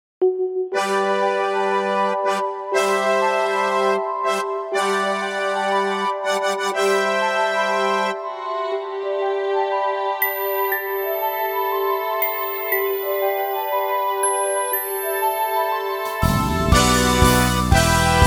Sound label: jingle